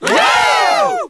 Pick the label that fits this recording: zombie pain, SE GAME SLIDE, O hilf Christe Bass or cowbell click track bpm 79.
SE GAME SLIDE